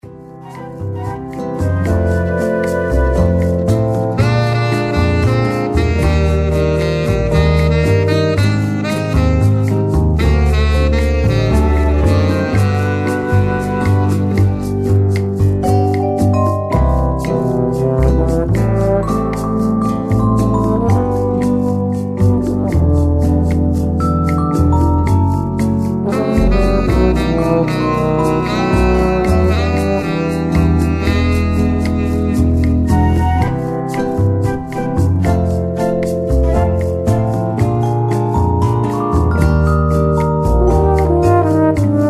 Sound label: lobby high limits